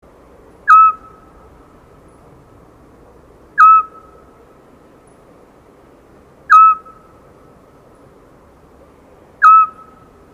assiolo bird

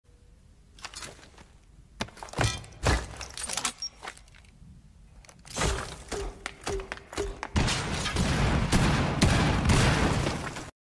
cluster charge; fuze; r6

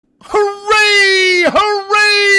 hurray

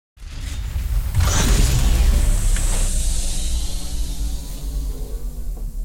large door open

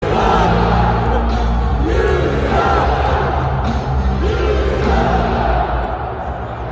kurt angle chant

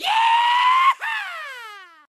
yeeeeeeehawwwwww

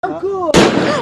explosion

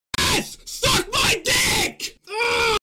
scream; npesta

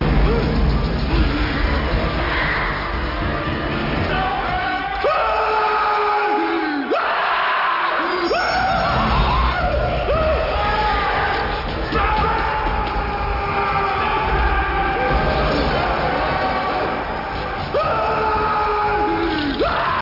scream; conspiracy theory

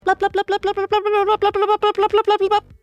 plap plap plap

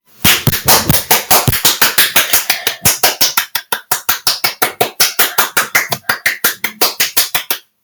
clap clap clap clap clap

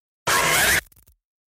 dramatic; dramatick

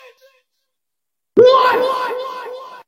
fahh whaat

